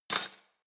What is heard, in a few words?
cutlery spoon drawer throw